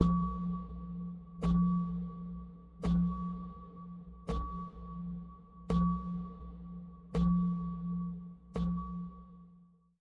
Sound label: Calming